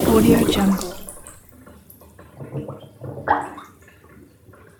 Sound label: bubbles